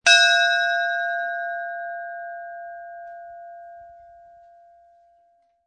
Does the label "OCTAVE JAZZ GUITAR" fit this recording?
No